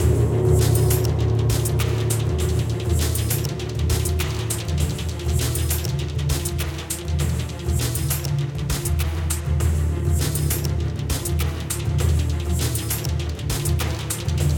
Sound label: Forest Fight Low; Red Goddess Inner World